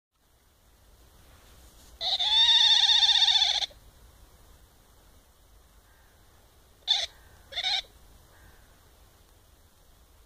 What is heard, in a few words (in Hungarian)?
vidra